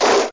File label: BUBBLES